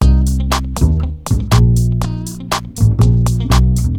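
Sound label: Ambient Stream; Fashion Studio Paris Collection; nds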